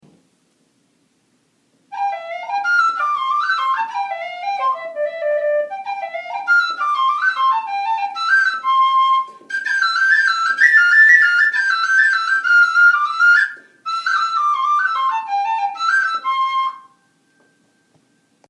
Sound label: Jig WHISTLE